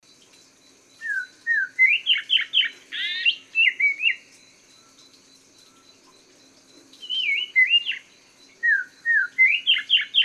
suara poksay mandarin variasi

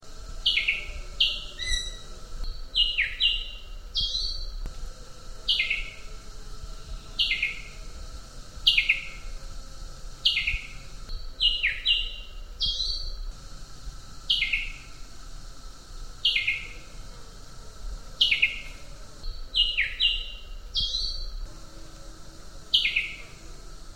sg sulawesi

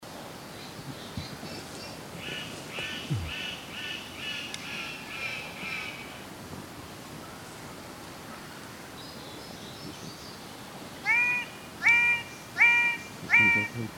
suara cenderawasih biru paradisaea rudolphi

